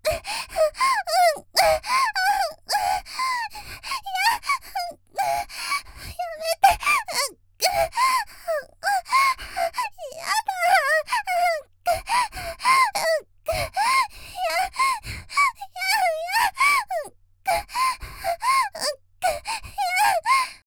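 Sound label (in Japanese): （少女）「喘ぎ」（だめ、やめて）と言いながら（嫌がり）, 元気な少女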